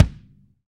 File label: BD, Roland; Sound & Vision - Gigapack I